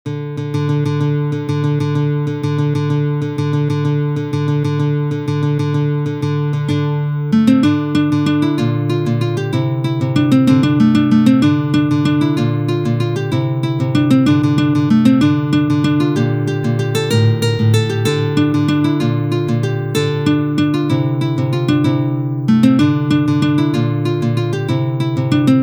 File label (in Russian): na gitare